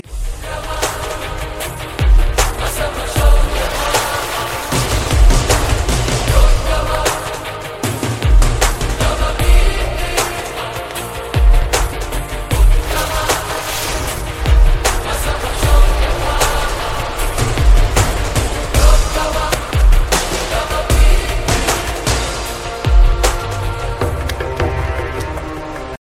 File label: telugu tone